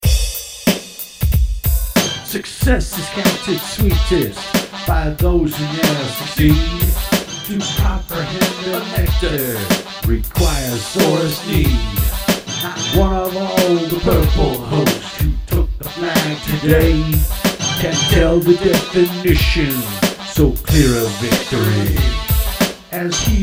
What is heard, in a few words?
rap